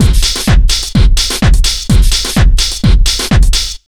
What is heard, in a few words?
127 BEAT, Disco House; USB Soundscan vol.20; Fresh Disco House I; AKAI